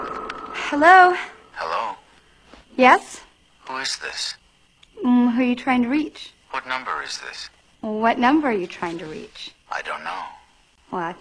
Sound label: scream